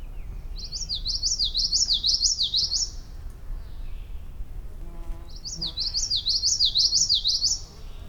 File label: Yellowthroat Song